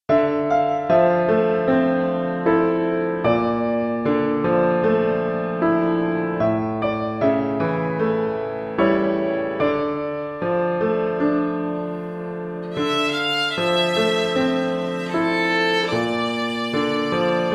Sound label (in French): Hemiole Violon et Piano